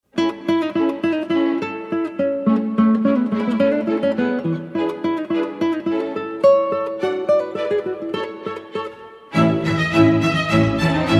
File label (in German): Gitarrenkonzert; Lhoyer